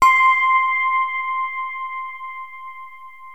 Access Virus B; Synthworx

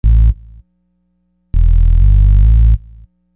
Hard Synth Bass

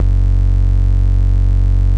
bass, Dance Mega Hip Hop Electro; EastWest

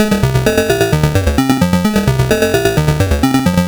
Sh101 Loop, 130BPM

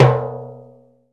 CENTER, Timbale Battery

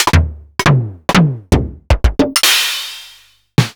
Fricke MFB 712

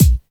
BD, Dance Mega Drums, HIP

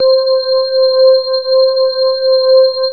HX 1 ORGAN; KEYS; Alpine Volksmusik

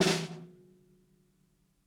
ROOMY SNARE BUZZ; Basement drums vol 2

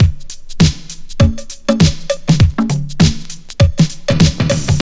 house loop

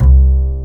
UPRIT BS C2, WOOD BASS

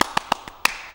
MULTCLAP, MULTICLAPS; Best Service; World Colours

